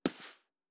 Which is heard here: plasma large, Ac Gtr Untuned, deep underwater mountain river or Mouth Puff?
Mouth Puff